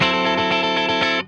D JANGLE, Funk Guitar; Zero G - Funk Guitar

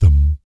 BASS THUM; Spectrasonics; Vocal Planet; Jazz FX

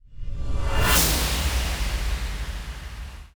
tallic

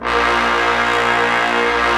BRS SECMT, Ensembl.mute; Roland LCDP06 Brass Sections

